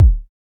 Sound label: Kick; Samplepack Produtique